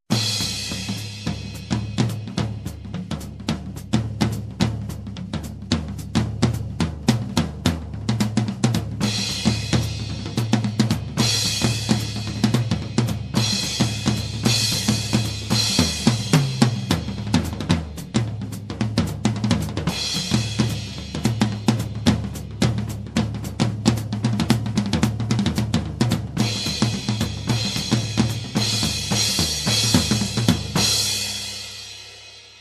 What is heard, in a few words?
Jazzy Tom groove drum beat